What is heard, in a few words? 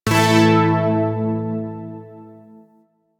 Shawzin Chromatic Chord; Zariman Shawzin